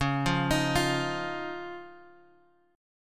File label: Db Major Add 9th, Guitar Open G